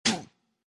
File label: Pain hit bear